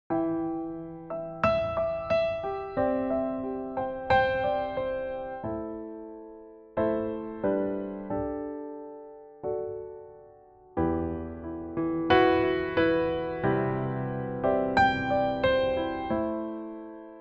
Tranquil Nocturne; admiralbob77

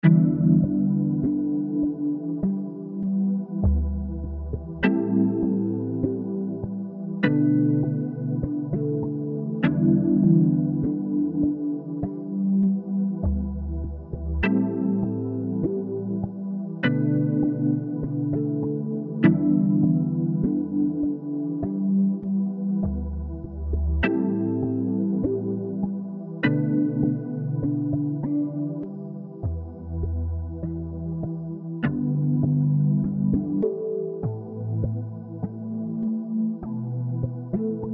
E100 Kinetic Groove

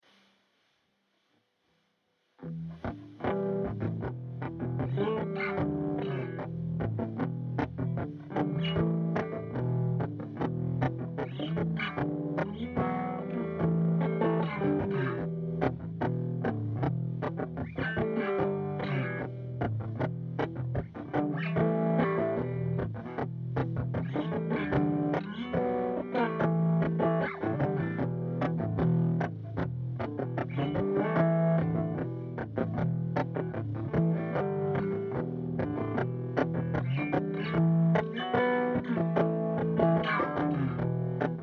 Em150 GTR motif

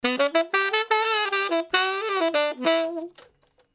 brass loop

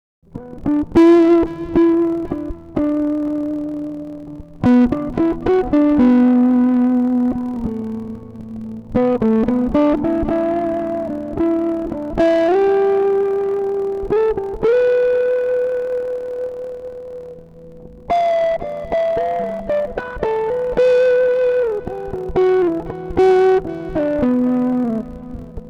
Em 112 GTR impro

